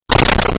pow lightning; Mario Bros

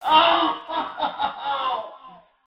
scream

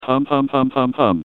laugh evil; John Madden

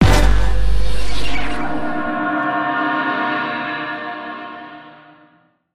pong

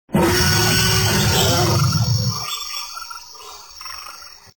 roar, t rex

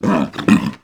attack; tf; slender; gabel

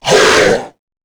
attack; tera creatures; abomination guard

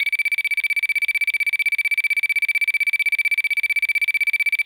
overcharging, weapons gauss; hl2dm

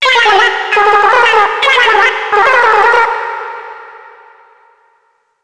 retro; slender; isabelle

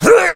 heavy pain sharp; tf; duke

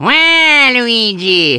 wah; tf; waluigi

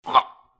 hurt

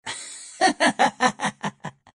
laugh taunt; Adachi

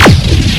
fire, weapons; hl2dm

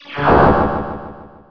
jump; tron

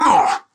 pain; tf

